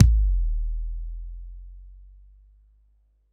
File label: Dance Club, Drum Machines, Korg 01W